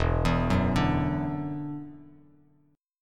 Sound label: E 7th Suspended 2nd, down, Bass Standard; E7sus2; arpeggiated